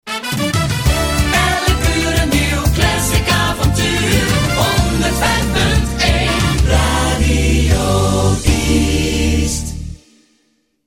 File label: jingle; Diest; Classic Avontuur